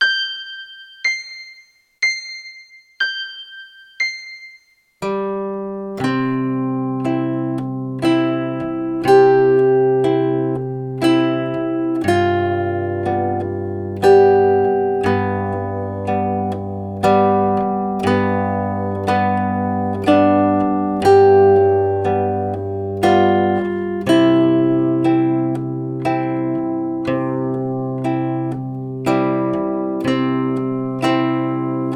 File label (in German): Zwei kleine Walzer; Neue Zitherschule